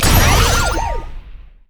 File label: dash; Vi